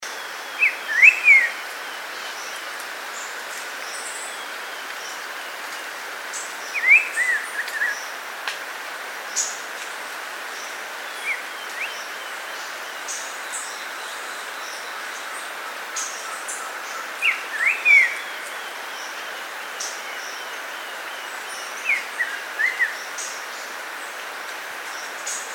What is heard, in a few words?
Pepitero Negro, Pitylus fuliginosus, Black-throated Grosbeak; cantos